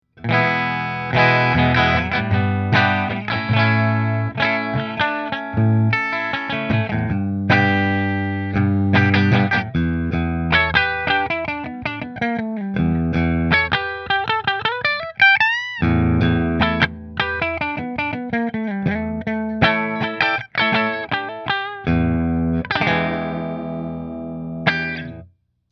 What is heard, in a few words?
Nola Model 8 Bridge Split Fender, guitars; New Orleans Guitars Model-8 Natural Finish